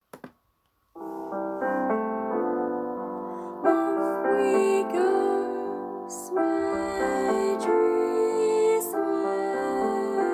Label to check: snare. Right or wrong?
Wrong